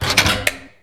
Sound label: Main lever good